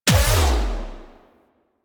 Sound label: Melee Heavy Attack Lift Impact; Warframe; Weapons; Whooshes; Heavy Attacks